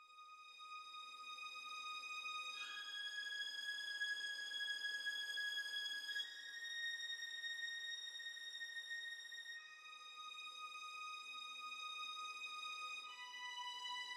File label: GTR